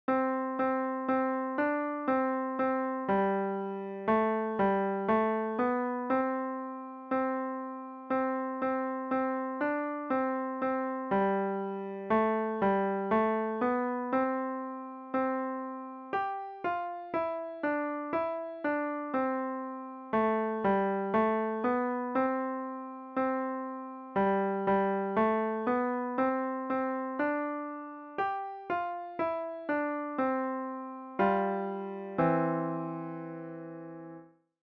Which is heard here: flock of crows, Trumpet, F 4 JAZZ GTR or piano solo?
piano solo